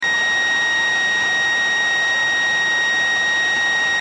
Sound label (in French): ordi acer